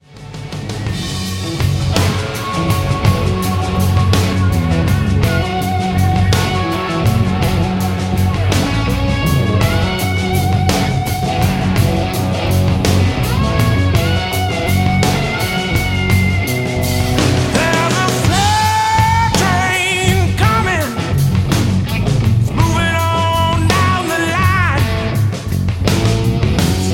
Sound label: blues slow train